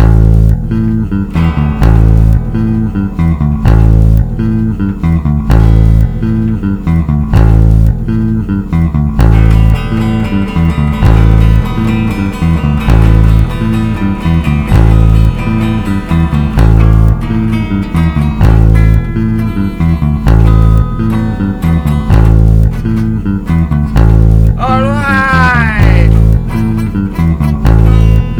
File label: Electric Bass